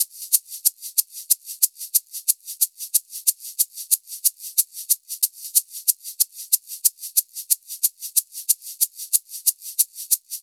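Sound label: percussion, Roots Funk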